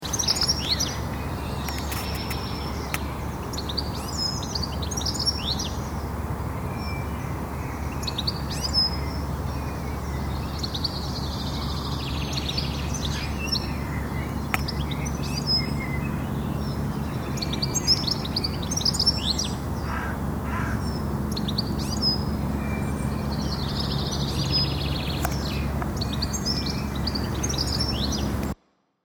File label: goldfinch chaffinch and others